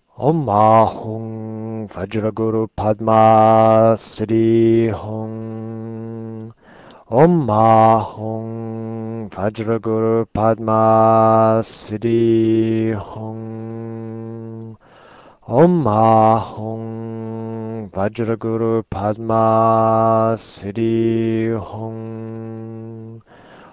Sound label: Om Ah Hum Vajra Guru Padma Siddhi Hum, mantra; padmasambhava